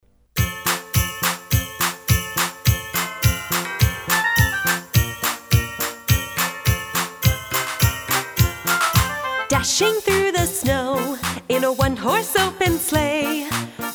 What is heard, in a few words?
Jingle Bells